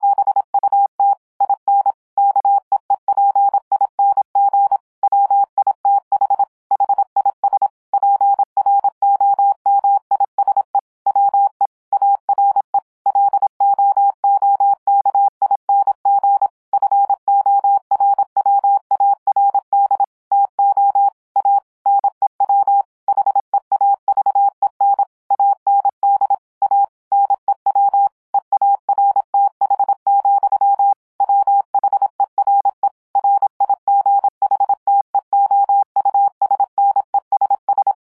25 wpm, morse code; 2 Peter 3 13

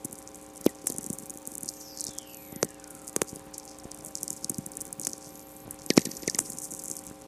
whistler, Auroral Chorus VS ferics; Keeler; inyo

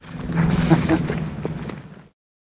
laughter

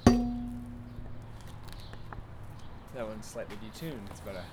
single hit; Listen01 Sample Pack

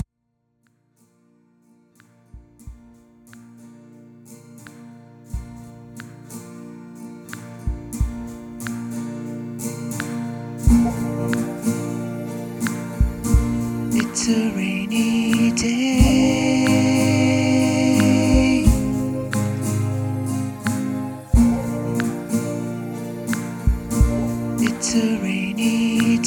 On a Rainy Day Acoustic

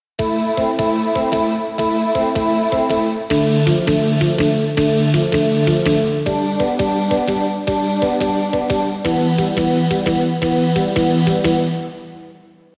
Synth vox, Kawai K1

